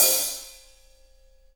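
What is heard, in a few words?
hi-hat, Real Kit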